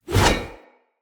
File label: Heavy Whoosh, Swords; Weapons; Mockery; Mockery Brazard